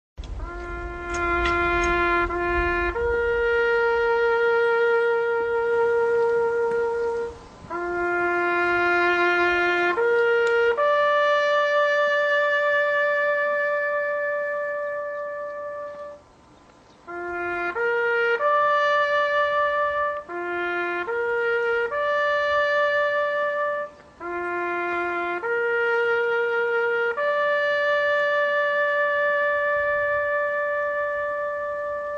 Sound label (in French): Musique Militaire Sonnerie aux morts Américaine